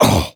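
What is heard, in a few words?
small grunt, voice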